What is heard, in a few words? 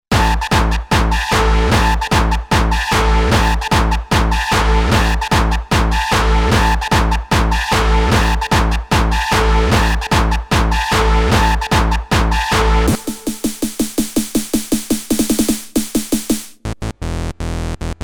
Hardstyle